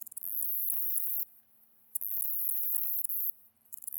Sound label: song, katydids; neduba; lucubrata